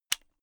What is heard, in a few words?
unplug safety plug